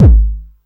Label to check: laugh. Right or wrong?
Wrong